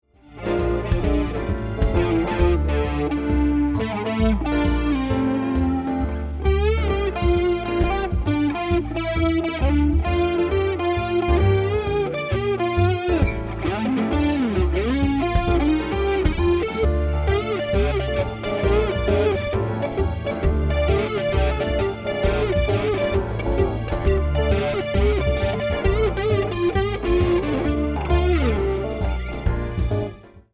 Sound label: lead